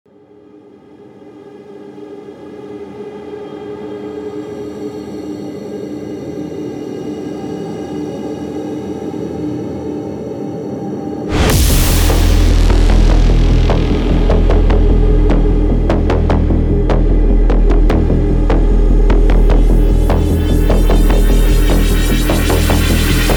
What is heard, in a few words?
Haunted Cinematic Soundscapes; ambient; Black Octopus Sound; Futuretone